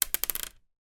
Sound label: human tooth drop onto worktop